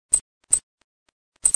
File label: zip